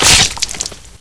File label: butt impact, antlion; hl2